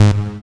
SYN POLY

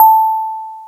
DX PIANO

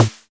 Snare, Alesis DM5

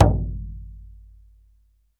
BLACKFOOT, American Natives; E-MU Formula 4000 Series Vol. 4; Earth Tones

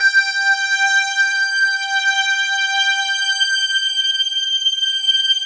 MINI LEAD G5, WAVESTATION; Greytsounds VOL-1

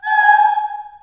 G#4 child oh, Choir; Acoustic & Synth; USB Soundscan vol.28; AKAI; CHILD OHS

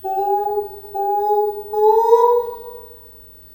DOVECALL, Heart of Africa; Spectrasonics; BIRDMEN

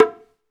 PRC, Bongos

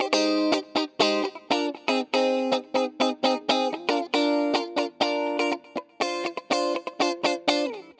Guitar, 120bpm; Construction Kits; Zero-G; Nu Disco; Nicengroovey C

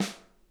SNARE, Interactive Drum Kits; Sonic Reality

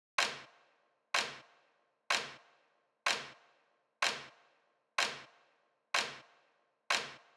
Clap, 125bpm Dm, Nu Disco; Zero-G; Construction Kits; Speedy

